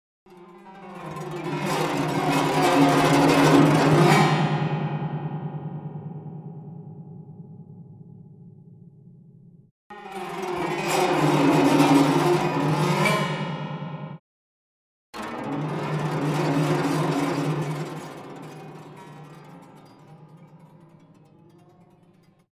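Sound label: Guzheng FX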